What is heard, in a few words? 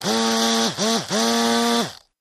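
Motor Small Vibrate, variable speed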